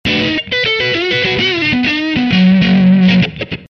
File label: B4 130 rhythm